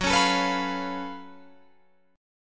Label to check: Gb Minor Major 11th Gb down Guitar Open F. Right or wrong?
Right